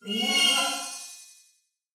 crystal enemy; battle

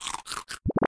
eat, move

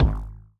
ball bounce wave; CrossCode; battle

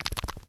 wiggle; World Of Goo

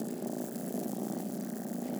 purr; shokat; battle

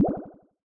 blubb, battle; CrossCode

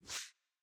dash; CrossCode; battle

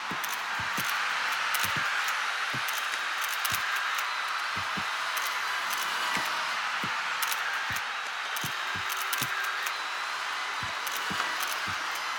celebrity Crowd; World Of Goo

